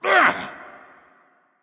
grunt hurt; smite; npc; olympus

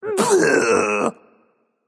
tongue, voicepack; smite; jorm

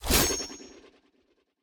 dagger spin; smite; pele

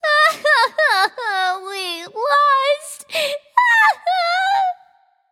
nv boohoo; charybdis

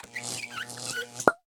idle ear squeak; smite; kuzenbo

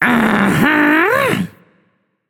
agree, voicepack; smite; jorm

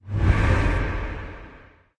body swish; smite; thanatos

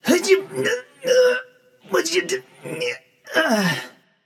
grunt lift; smite; janus

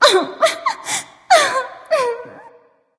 boo hoo; smite

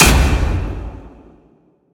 hit large; smite; god odin

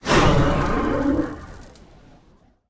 death ground magic; smite; osiris

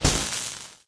spark; smite; chronos

